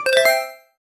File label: casual game win modern sound